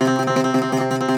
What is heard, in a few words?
Greek Bazouki, Plucked Strings